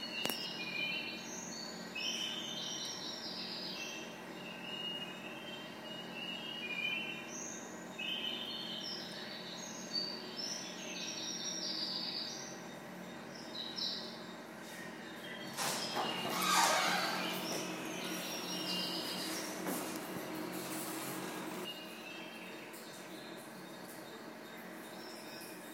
toilet birdsong

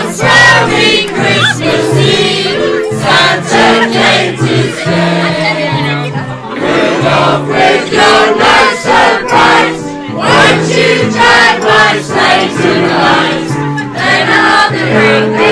pub carols

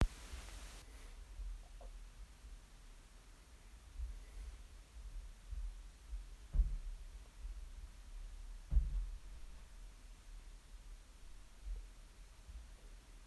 noise

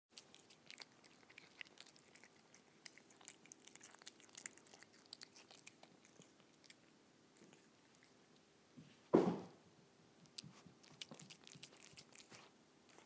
cat licking melted cheese off ceramic plate

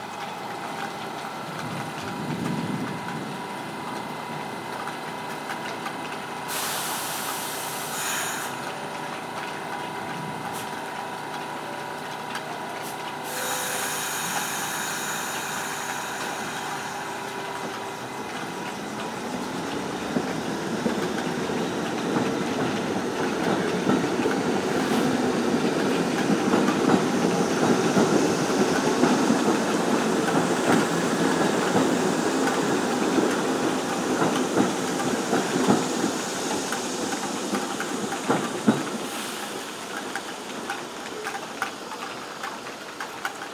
rain train trash

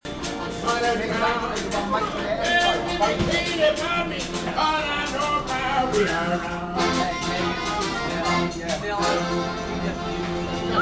bbq music night; smokin pete's bbq

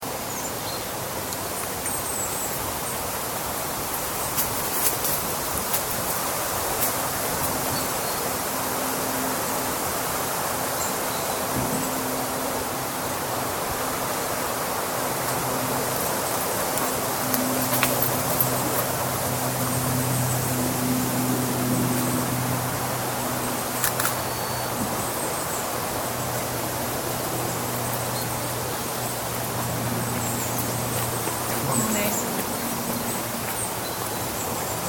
stopham woodland soundscape; uksm

